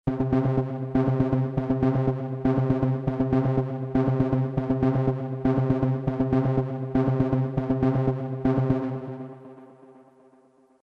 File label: ARP 1/32 Reset None Repeat 1; Elysion 2; Positron